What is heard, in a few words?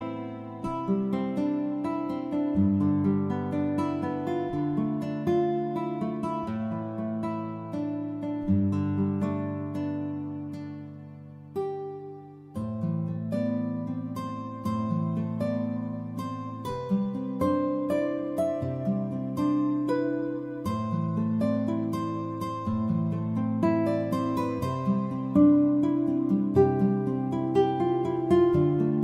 Guitar